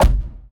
ball collision geo low

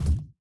Hand Paddle Attack Light

